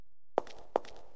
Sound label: nock